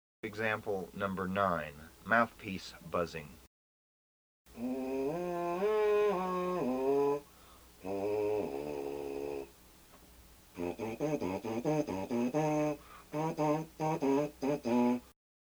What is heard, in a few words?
Emb TUBA